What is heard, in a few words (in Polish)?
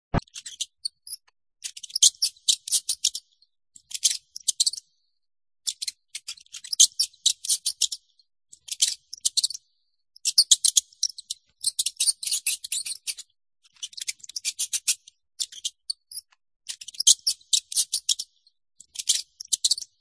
Mysz